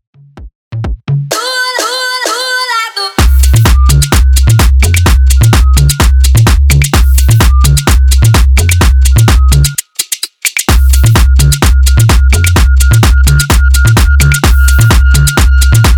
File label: Bass House 128bpm; Mixshow Edit